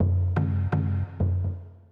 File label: PERCLOOP ETHNO 125